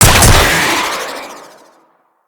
Hand Rocket Launcher Shot; Weapons; Corpus; Crp Hand Rocket Launcher

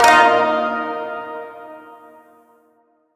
Shawzin Blues Chord I; Chords; Warframe

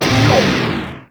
Proj Large Fire; Warframe; Lizzie

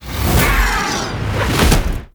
Grab Fail Escape Whoosh; Warframe; Teralyst; Ropalolyst; Abilities

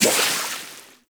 Water Sprayer Beam Stop; Warframe; Weapons

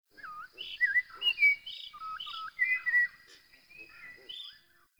Bird Thrush; Warframe; Ambience; Nineteen Ninety Nine

